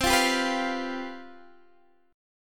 C Major 7th Suspended 4th C Guitar Standard, CM7sus4 chord; strummed